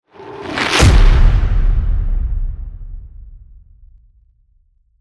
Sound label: falling weight whoosh sound